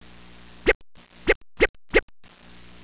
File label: rap